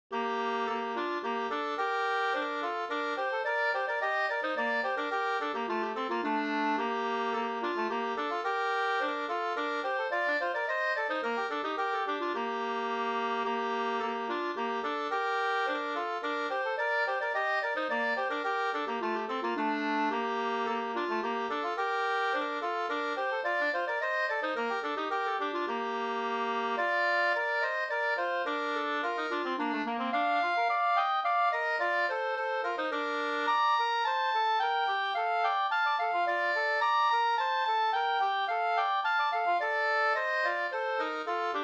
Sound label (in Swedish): slängpolska